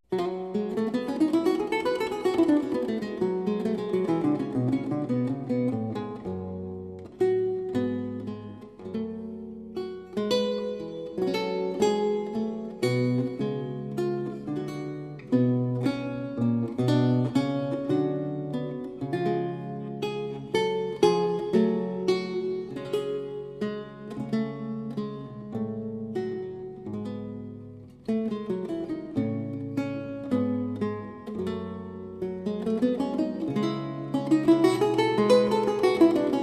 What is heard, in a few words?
Recercar, on renaissance lute